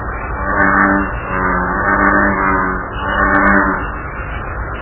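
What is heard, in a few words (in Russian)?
самая большая лягушка в своей среде обитания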